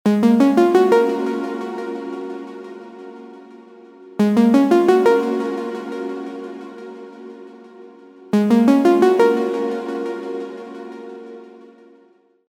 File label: pluck; nocturne